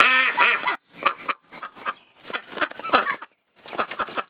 Duck Sounds; RailPro

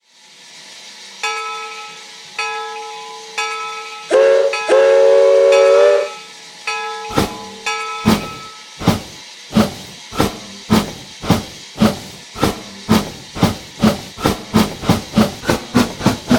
Light Steam; RailPro

